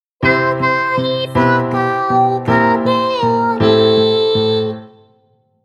base down song

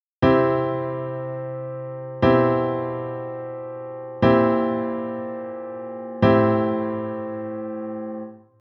cliche c base down piano

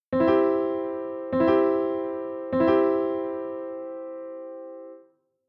chord progress c c

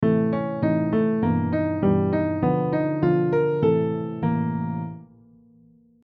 scale melody minor bad

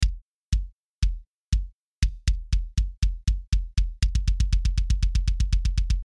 kick

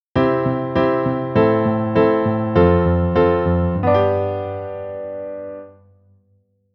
kadenz piano

